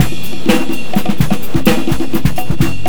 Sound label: ACOUSTIC, Big Beat Drumloops